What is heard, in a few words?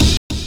amen